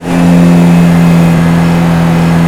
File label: simulated vehicles revdown